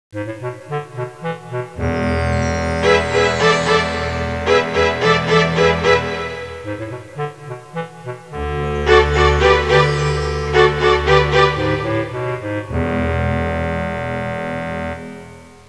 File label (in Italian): musica sinfonica; symphonic